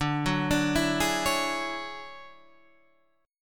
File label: D-Major Sharp 11th, D